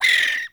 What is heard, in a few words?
Exterior Forest Critter; Ambience; Duviri; Warframe